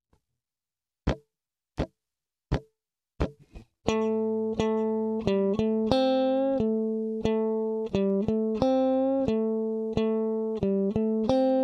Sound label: r lick, blues guitar